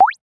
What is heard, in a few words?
wet notification